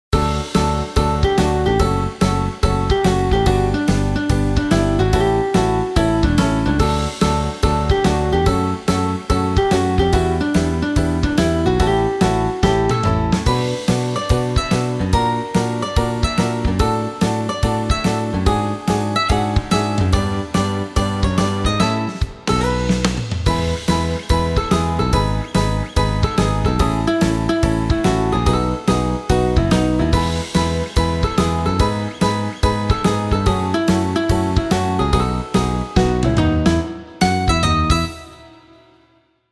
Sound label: shuffle, 144